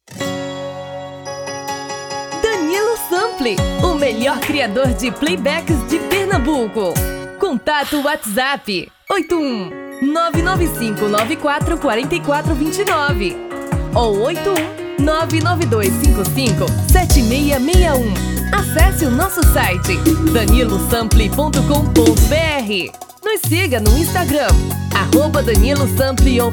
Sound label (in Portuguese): Vers Brega